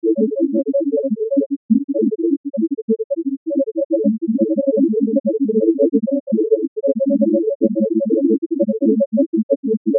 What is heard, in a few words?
granular test